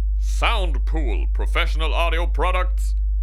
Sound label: 50hz